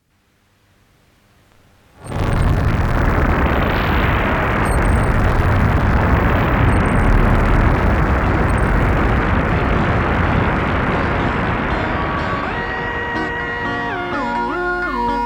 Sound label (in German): Film Musik